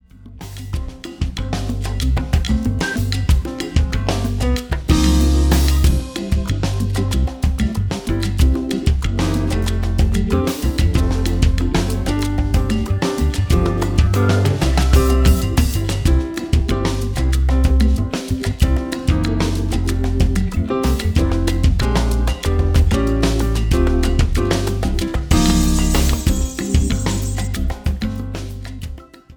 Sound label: jingle bells, backing tracks; xmas vol2